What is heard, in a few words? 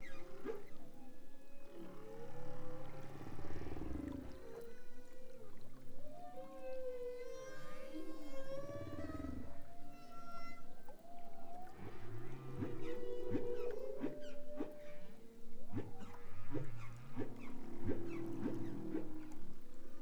humpback